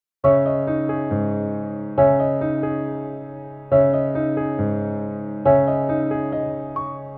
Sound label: 138 BPM C# Min Piano; Loop Stems; Cymatics; 2022 Melody Mystery Expansion